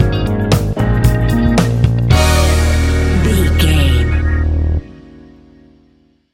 retro disco revival stinger, A fast paced piece of electronic dance music